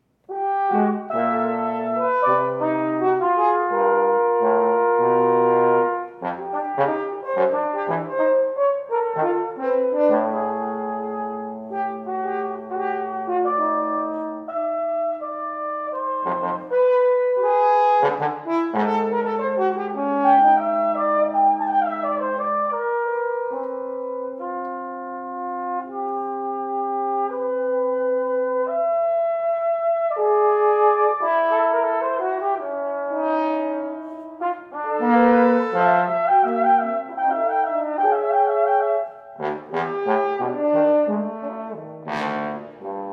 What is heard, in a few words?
Trio